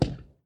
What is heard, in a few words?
lift, firework